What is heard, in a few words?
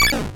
laser, weapons